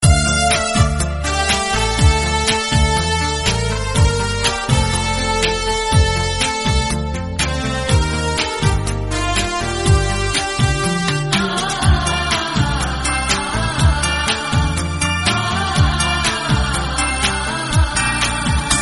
Devotional Ringtone

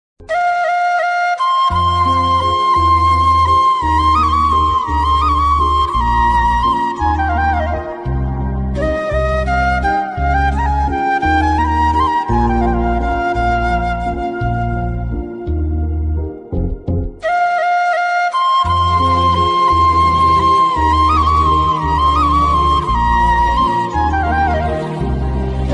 Flute Ringtone

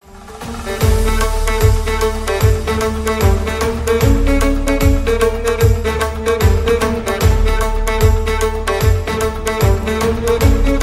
Instrumental ringtone

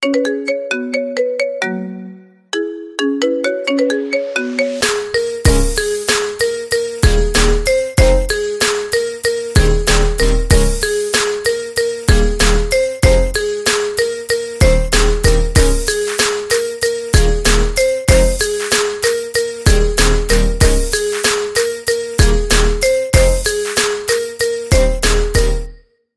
Marimba Ringtones Remix

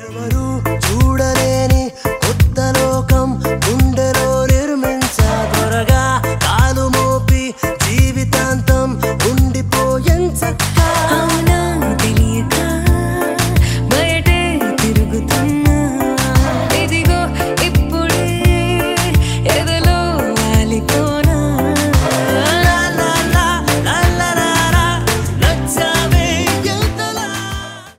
Telugu

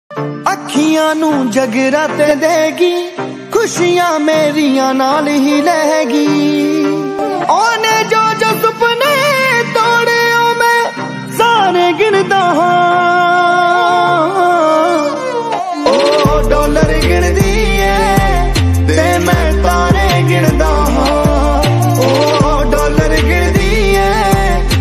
Sad Song

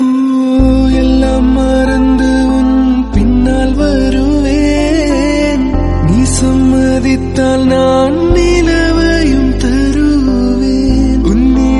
Crazy Sad

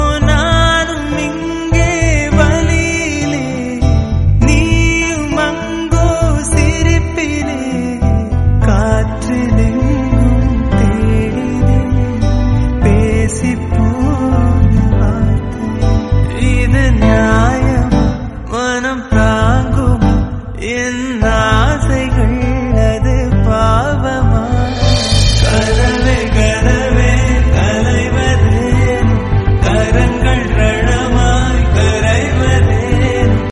Tamil Love

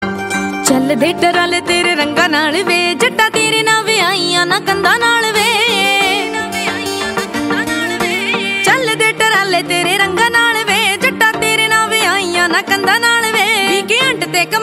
punjabi ringtone

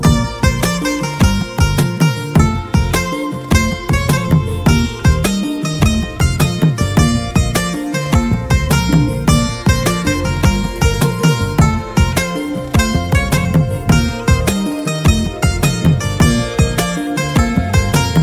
Tamil Music

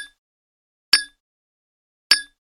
Glass Bottle Clink

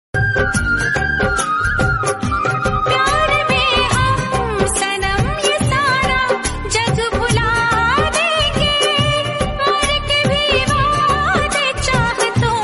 Hindi

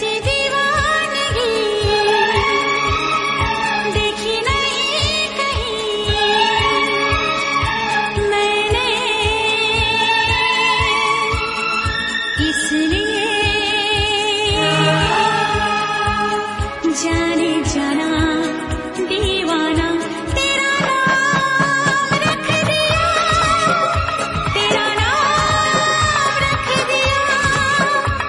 Old Song Ringtone

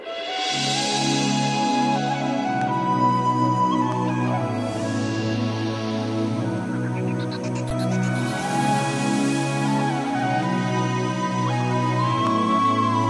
Flute Ringtone

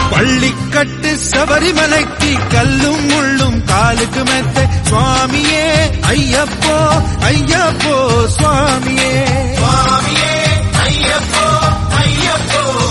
God Songs In Tamil

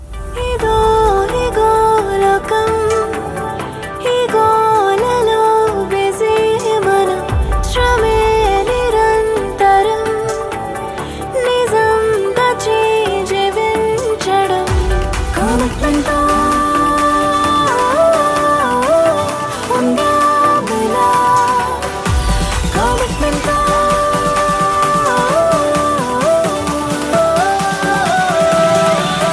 Telugu

